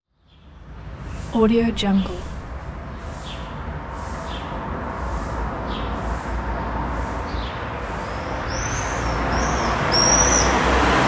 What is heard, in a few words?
city ambience with traffic and street cleaner